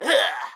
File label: Damage; Pecheneg Red; Folk Hero